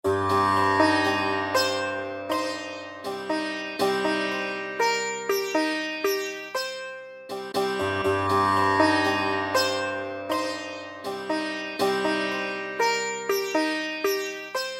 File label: Sitar Loop